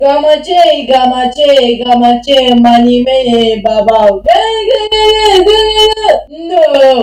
pigeon

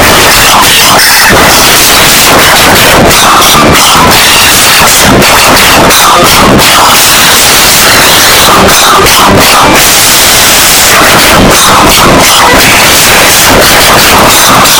jingle flute

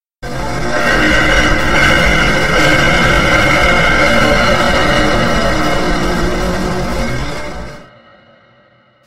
metal emmmmmm

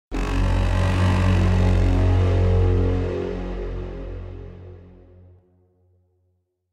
generator sound; frostpunk

